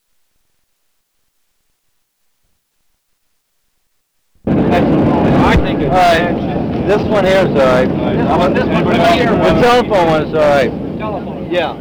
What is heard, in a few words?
Choir